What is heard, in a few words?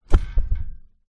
household bunkbed mattress thud drop metal frame